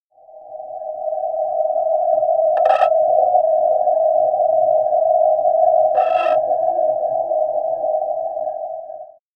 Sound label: harp dyn interference